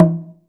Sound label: LOG DR HI, LOG DRUM, Africa